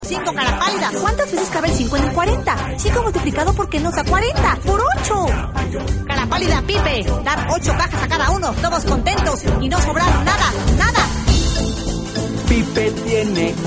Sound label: pipe